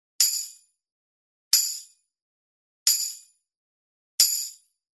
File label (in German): Schellenring